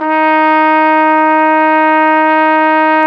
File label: Flugelhorn, Solo Brass; Flugelhorn 2